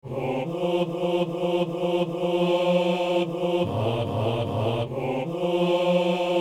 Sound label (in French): a tes enfants seigneur accorde ton amour basse